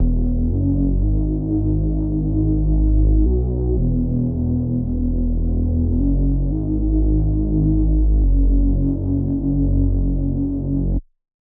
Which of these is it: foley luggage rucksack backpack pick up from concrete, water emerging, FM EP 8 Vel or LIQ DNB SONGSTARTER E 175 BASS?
LIQ DNB SONGSTARTER E 175 BASS